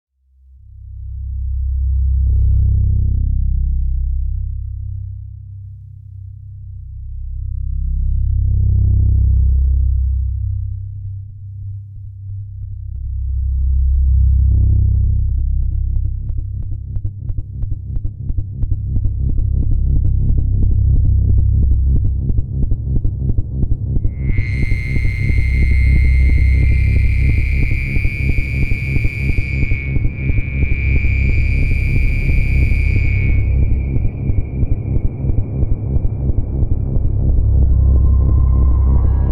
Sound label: Horror